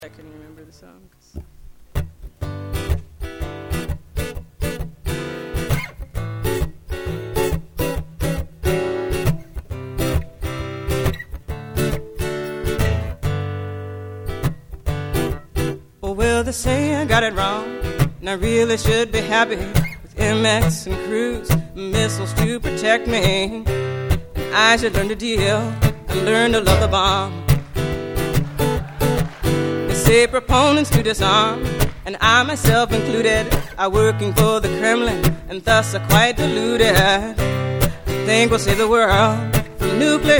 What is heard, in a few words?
Missile Blues